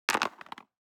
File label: DICE on Cardboard, Throw and Roll, Standard, 4 Four Dice; Samples; ArticulatedSounds; DICE Sfx Party (Extended)